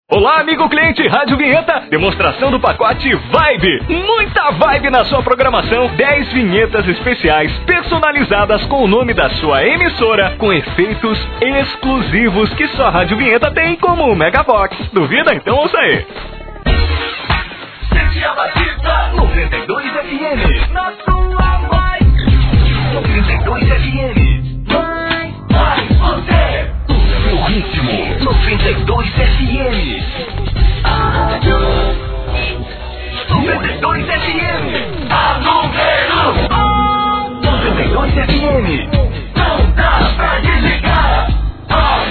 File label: vibe